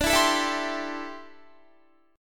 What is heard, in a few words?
D 7th Suspended 2nd Suspended 4th, D, down, Guitar; strummed; 4E,4A,5D,5G,5C,6F; chord